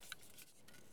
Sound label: drink can draw; Escape from Tarkov